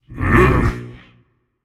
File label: ugh, voicepack; smite; jorm